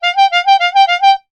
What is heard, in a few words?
transportation bicycle hooter horn; sound effects one